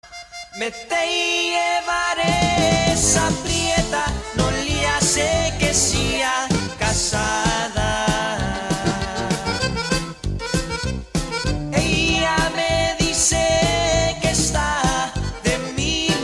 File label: Spanish